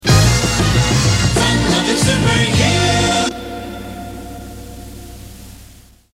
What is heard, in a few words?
Jingle; WYOO; FL Super U